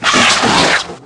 Lrg Spear Spin, Aliens

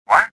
confuse; worker3

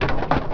wood, Impact

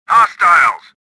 anger, swamp

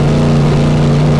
LOW, Engine; Cars

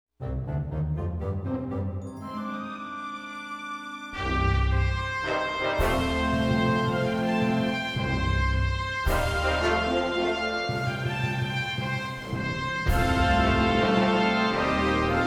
logo, music flourish

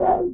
Open Menu; golf; ProStroke Golf World Tour 2007

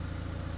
honda eng; Chase Hollywood Stunt Driver; XBOX

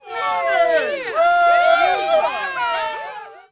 CROWD POS LOW; Cars; XBOX

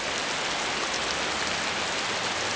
rain storm; Pirates Of The Caribbean

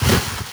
slide

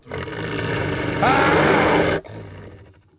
attack; nord; The Elder Scrolls III Morrowind GOTY; XBOX

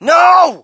crowd, shout; Mike Tyson Heavyweight Boxing

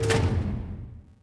lifter Claw end; machines mechanical

